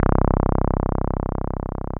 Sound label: TB-303